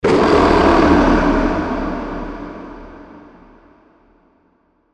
lion growl

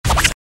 short movement sound

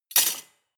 cutlery large drawer place hard

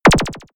lasershot

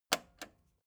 record player repeat jump out click slow

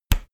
card flap

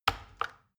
plastic button tiny click heavy slow

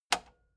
metal button spring click slow

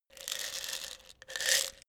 pillbox turn

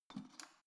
letterbox metal turning key

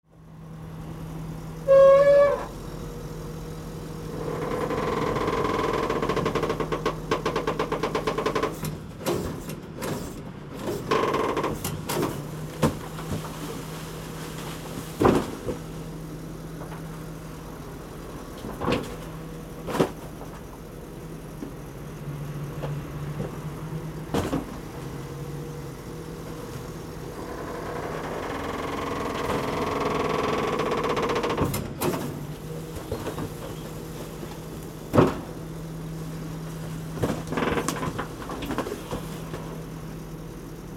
waste collection truck at work

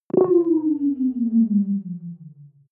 wobble drop high

